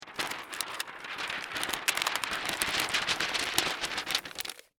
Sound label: Cellophane Roll, Foley, Gel, Roll, Up, Cellophane, Close, Color, Correction; Crackling